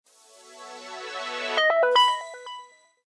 Power On, phonetones; LG; A200

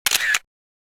Shutter; SKY IM-S610K; Camera

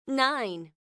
Key Tone; Samsung; GT-E1080T

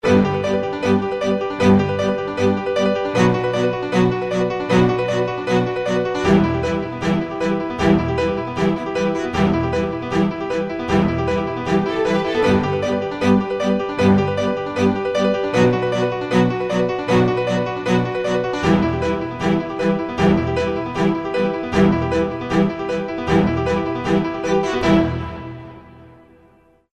formal

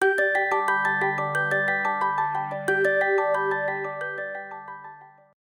Melody Bell; LG

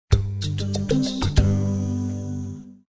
Power Off, phonetones system; KV500 Cookie

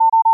in call notification; BlackBerry OS-10

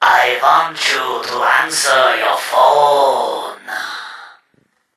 Vampire; Microsoft; Windows Mobile ringtones; Ring Tone Pack 6

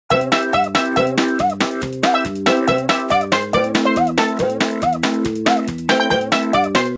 Funny; Sony Ericsson T700; VideoDJ

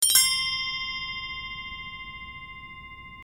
Coeur, notifications; i-mobile; i-style-711

